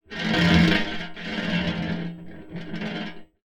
Baking Tray, contact mic, Metalics

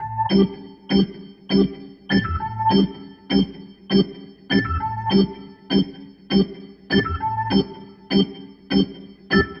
Rotor Riff, Organ

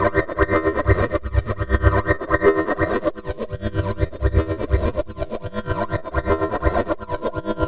synth 125 slurry C; Transmission-X

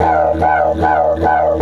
E 150, DIDGE RIFFS

